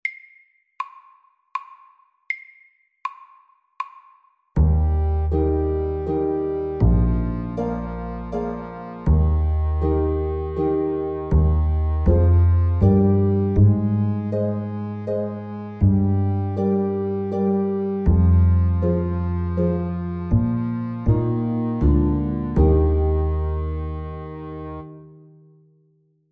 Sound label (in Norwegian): Sommervals, 80